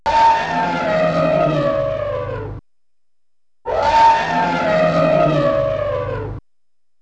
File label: elephant